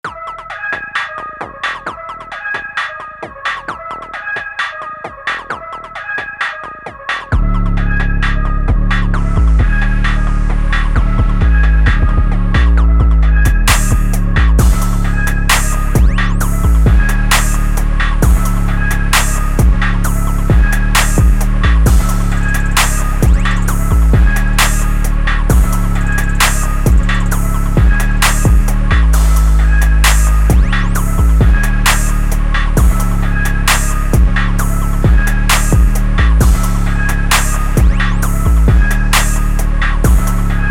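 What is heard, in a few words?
Beat 2 BPM 132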